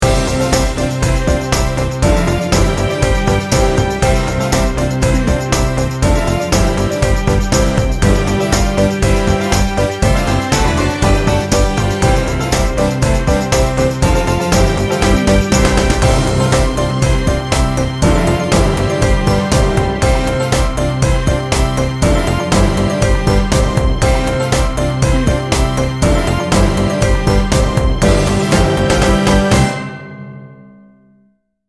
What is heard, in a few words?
deck the halls